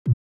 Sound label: gethit; Of Cabbages And Kings; pygame